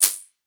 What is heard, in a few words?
PERC shakah